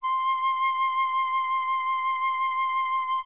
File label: flute C5 pitch shift up octave